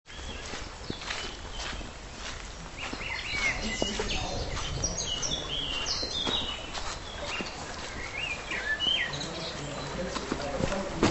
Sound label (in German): Eisenbahntunnel